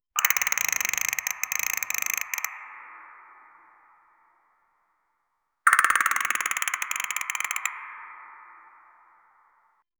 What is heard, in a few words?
sound effects forest ambie